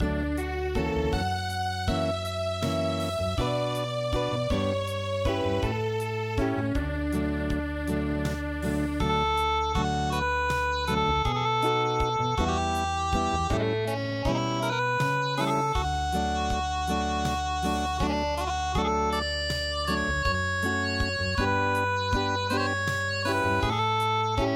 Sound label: Acoustic